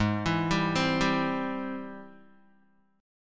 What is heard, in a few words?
Ab Augmented Major 7th Ab Guitar; arpeggiated; 4,7,5,5,7,x; Ab+M7; JGuitar